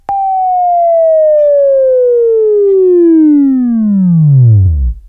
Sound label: Buzz, Sweeping Training